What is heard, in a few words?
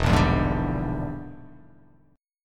E 7th Sharp 9th Flat 5th, chord